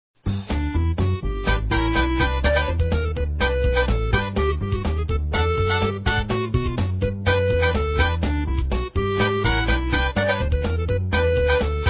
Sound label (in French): instrumental; j'ai l'esprit de BP